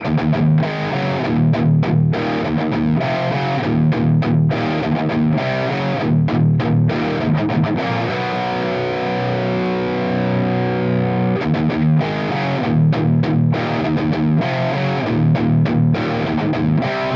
Dry - Hot Rod - Bridge, pickups; BYOG Hot Rod

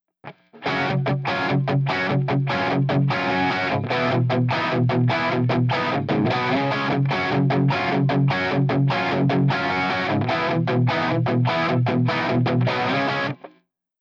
Strat Hot Bridge Dirty; Pickups; BYO Pickups

